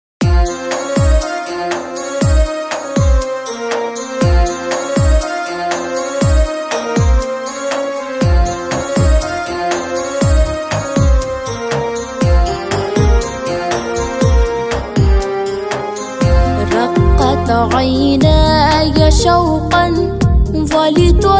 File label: assalamu alayka ya rasool allah female version